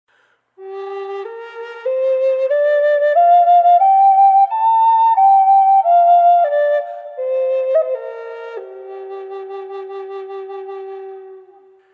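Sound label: G minor Scale and Solo Flutes; Tiger Maple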